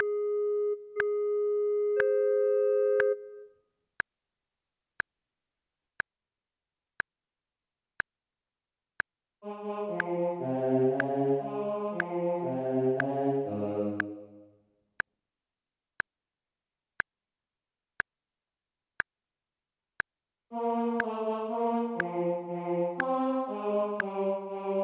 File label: O clap your hands; Gibbons